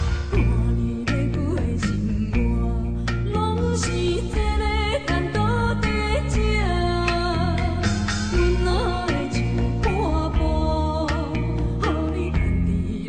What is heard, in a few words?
Chinese Song